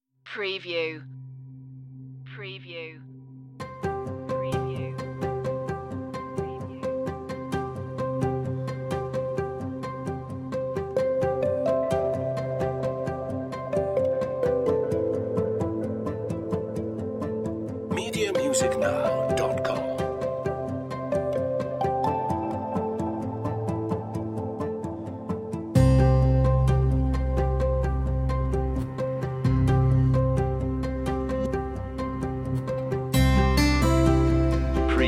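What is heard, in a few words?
joyful guitars, Acoustic Guitar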